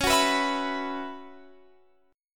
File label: Gb 7th Suspended 4th Db, chord